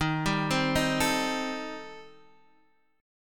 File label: Eb 6th Flat 5th Eb down Guitar DADGAD